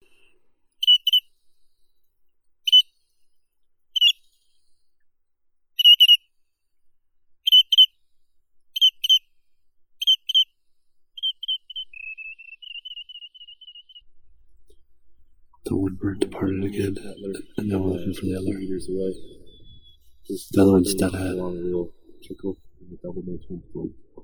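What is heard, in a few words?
wandering tattler, Tringa incana; ulit pepelnii amerikanskii; zvuki prirodi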